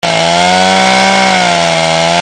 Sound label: saw cut